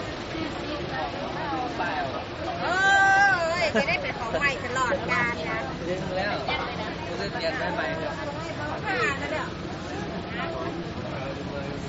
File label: central market